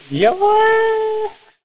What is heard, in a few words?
fart; cstrike